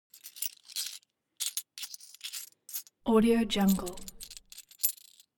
money coins big search long; foley; smartsoundfx

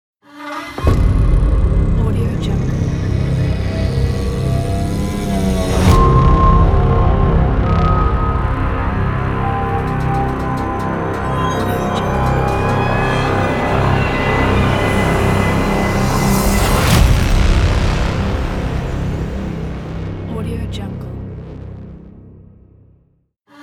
dark suspense horror intro

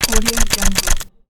typing

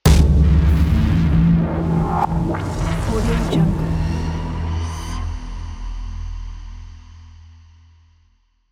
hybrid trailer cinematic suspense hit

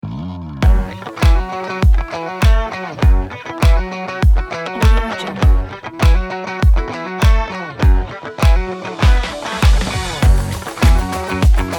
cool funk kit

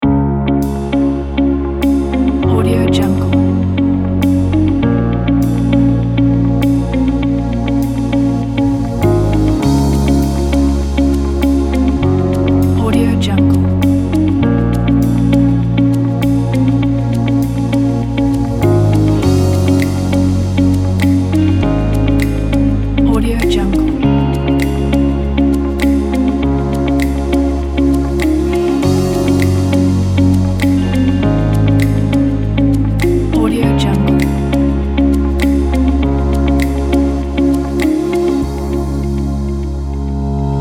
motivational inspirational upbeat; garybirdman

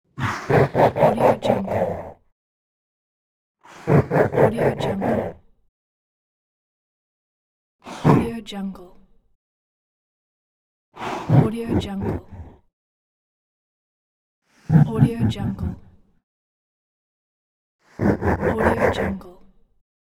demonic laughter

